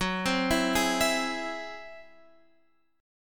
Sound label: G sharp Augmented 7th; x,9,10,9,9,12